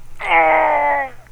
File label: pain, csgo french bot; csco